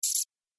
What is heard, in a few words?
Robot Double Swipe, sci-fi robot sound effect; Andrew Fleming; Twistedtracks